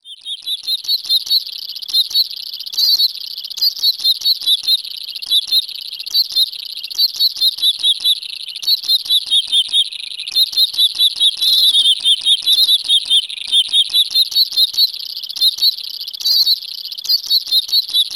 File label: Canary Alarm; Nokia